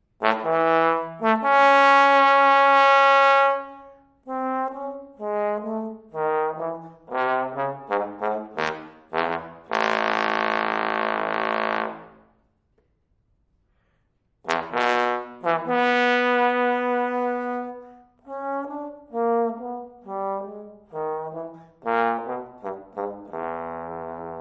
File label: trombone